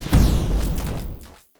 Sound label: spell shock throw; player